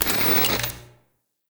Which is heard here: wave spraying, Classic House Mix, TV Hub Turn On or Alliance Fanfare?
TV Hub Turn On